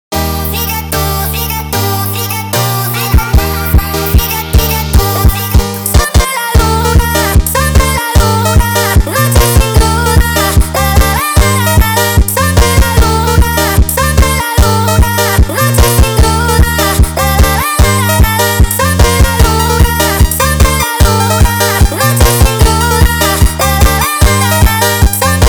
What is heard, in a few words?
Montagem sped Up